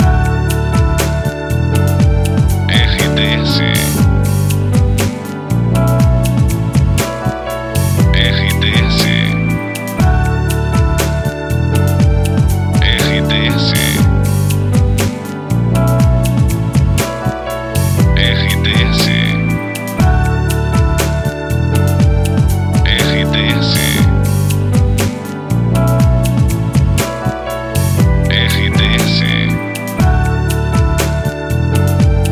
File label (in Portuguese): trilha para locução; Santos FM